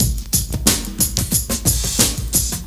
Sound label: 90 DRUMS